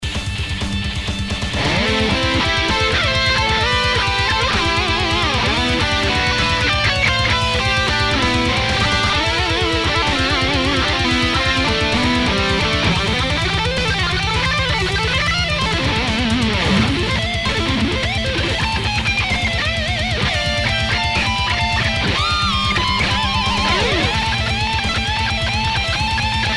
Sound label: Hail Hail solo